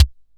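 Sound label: SDS V BD, bass drum; Best Service; Real Mega Drums VOL-1; MONO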